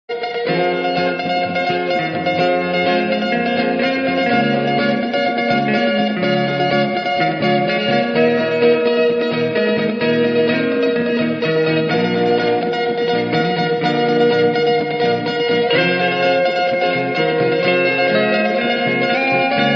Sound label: Acoustic medley; Last Ninja2